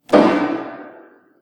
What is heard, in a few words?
piano